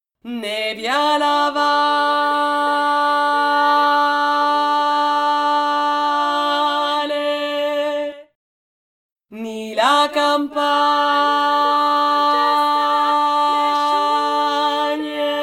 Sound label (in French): alto, Chant des « Magnarelles », les ramasseuses d’olives de la région d’Ortona (Abruzzes, Italie); Cascaluliva